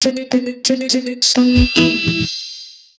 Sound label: vibrant beat, beat drop